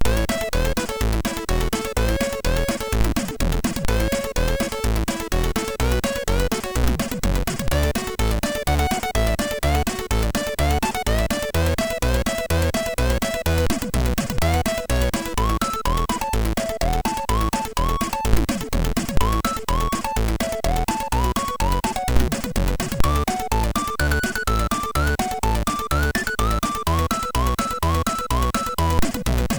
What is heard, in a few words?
Country, MOS6581R3